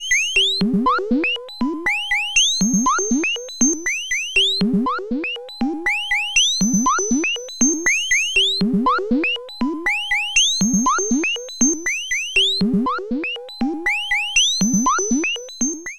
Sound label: Dreadbox Drips V2